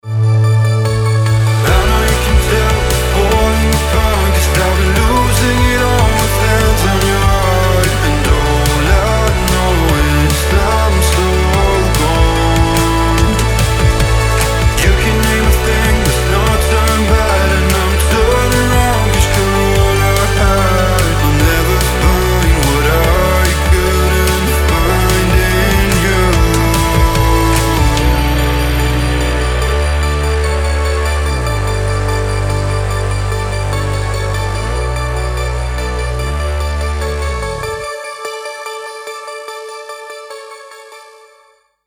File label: Sample Pop Mastered